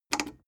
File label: plastic button small simple click fast